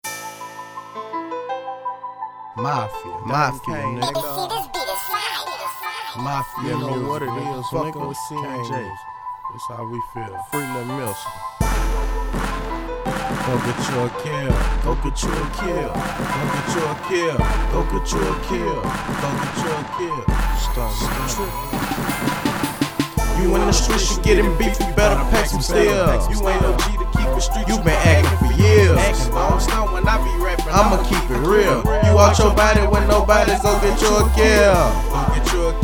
Hip-Hop

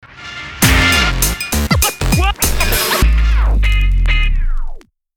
Coffee Break Beat

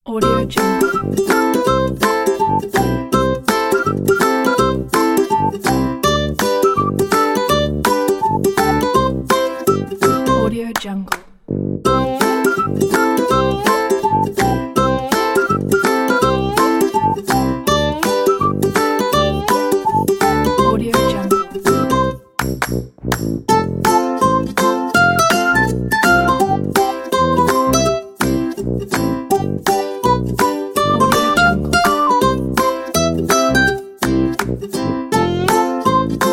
cute little artist; wavechop

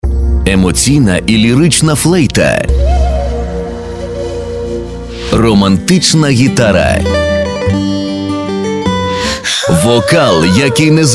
CONCERT PARTY Mix; Барбарис

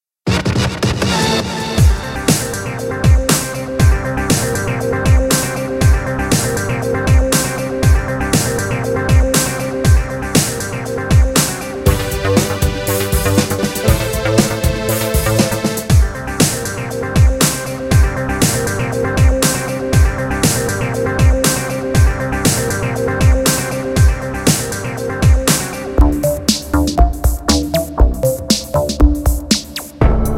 electro

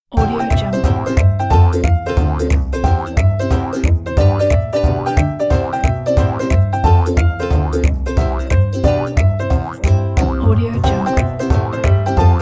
funny easter bunny jumping; wavechop

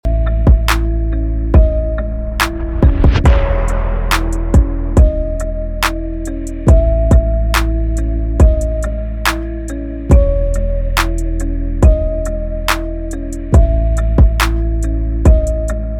beat

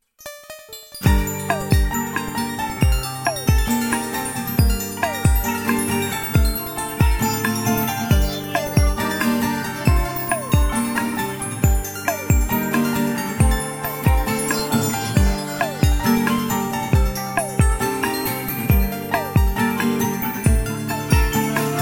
Atmosphere Music

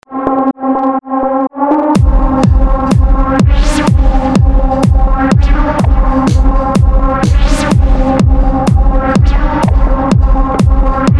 Techno

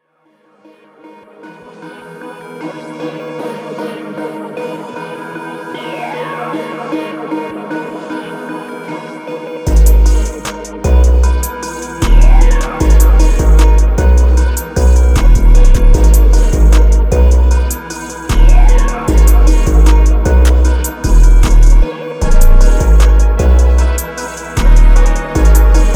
type beat; clonnex

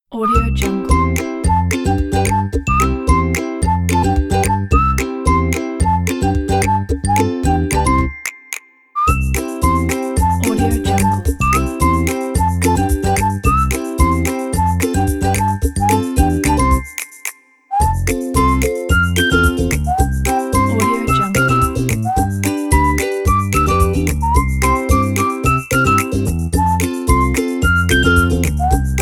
have fun with a whistle; wavechop

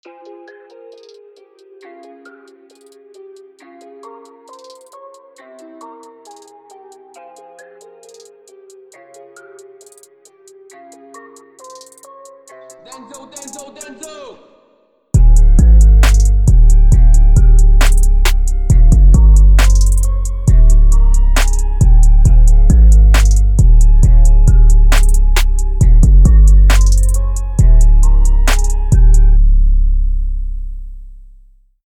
beat

